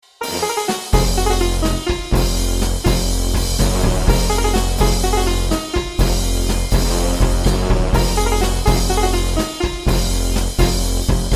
latin funky indian fusion virtual sitar brass percussion timbale; VST; syntheway